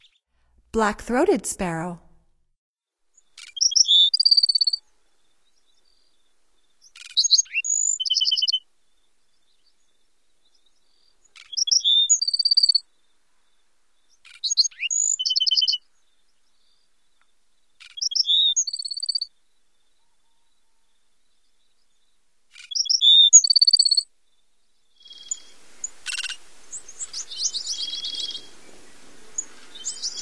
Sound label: Black-throated Sparrow, Songs of Birds of North America; Stokes